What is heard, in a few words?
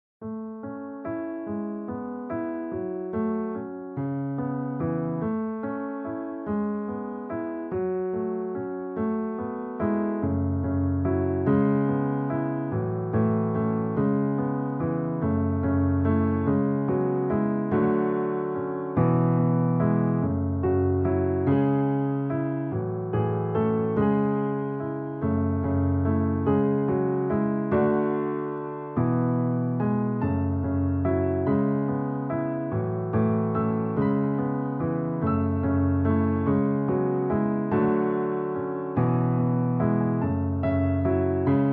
love ringtone sad ringtone; valentine's day